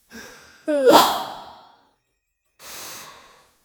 soft human gasp sudden

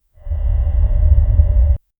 low hollow ghostly moan o

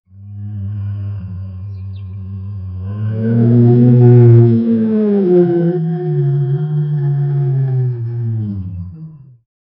a t rex roaring; tyrannosaurus rex

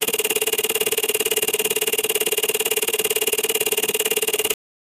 user interface

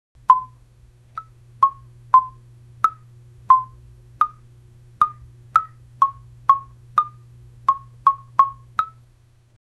simple punch sound

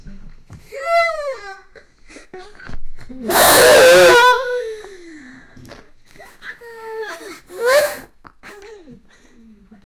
teenage girls puking wi; vomit

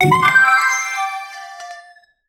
time finished, interface

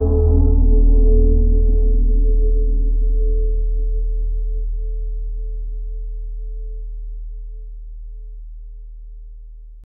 low tone deep resonance b, bell

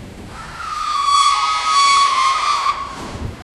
labored breathing of resc, equipment

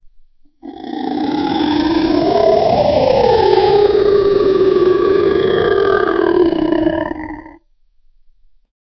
cute but powerful dinosau, cartoons

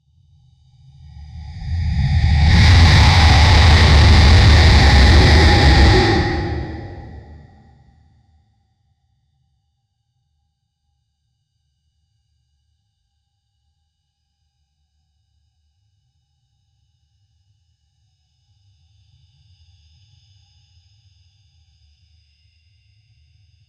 long dragon roar

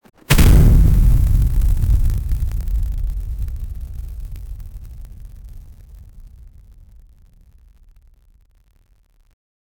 ethereal supernatural

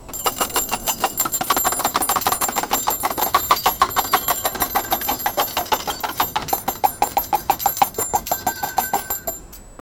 the sounds of santas work, holiday

